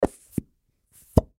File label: Suction Cup Up; Foley; Suction Cup Up Down; Objects; Blastwave